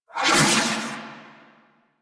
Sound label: Proj Hip Whoosh; Warframe; The New War; Quests; Weapons; Omicrus